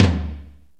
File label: RX7 HEAV TM; Crypton - DrumBase5000